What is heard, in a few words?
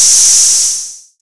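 Open hi-hat; Zero-G Trance Inducer; 90 s Sample CDs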